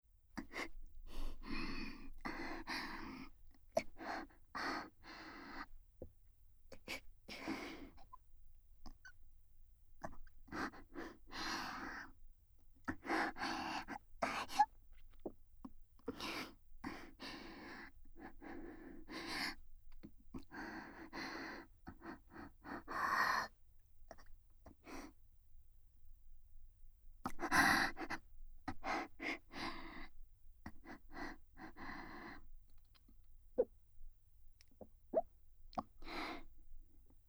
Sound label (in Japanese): 無声音声我慢喘ぎループトラック, ASMR; 萌声